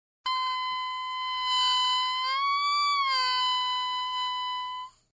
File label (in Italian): Ancia